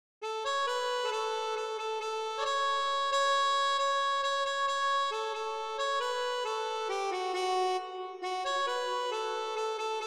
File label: harmonica